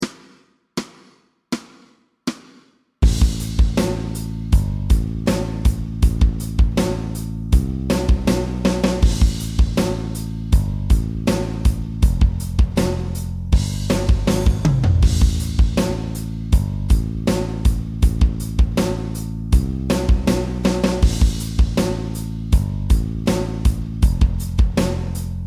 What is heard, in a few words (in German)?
Backing Track Rhythmusgitarre